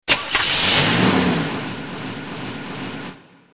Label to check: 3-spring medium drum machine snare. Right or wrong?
Wrong